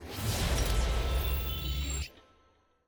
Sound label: sfx loot upgrade outro